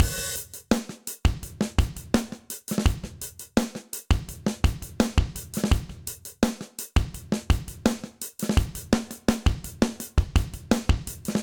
Bright Room 84bpm, Session Drums; Raincoat; Ableton; Factory Packs; Session Drums Studio

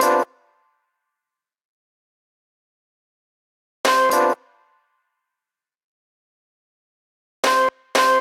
Loose Foot Hits, Breakbeats; Ableton; Breakbeats by KutMasta Kurt

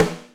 Rimshot; drum hits; snare; Ableton; Factory Packs; Drumdrops Recording Hybrid Kit

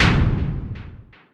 Metal Impact Delay

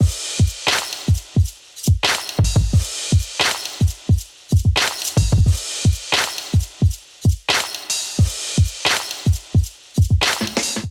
Groove 88 BPM, Drums; MIDI Clips; Blueprint; Ableton; Factory Packs; Chop and Swing

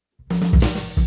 NuJazz Drums, Jazz

